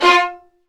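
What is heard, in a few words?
Violins Marcato; Roland LCDP13 String Sections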